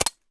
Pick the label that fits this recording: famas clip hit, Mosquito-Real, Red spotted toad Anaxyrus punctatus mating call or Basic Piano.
famas clip hit